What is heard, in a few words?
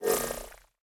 Hover; smite; god cupid skin13